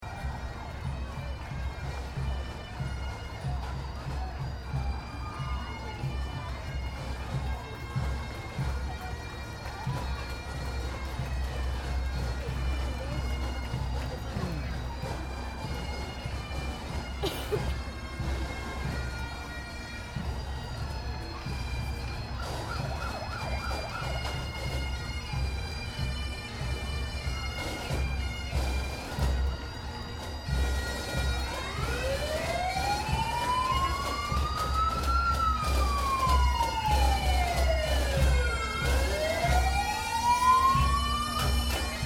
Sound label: Ambience Bendigo Parade Pipe Marching Band; Bendigo Dragon Parade, Easter 2009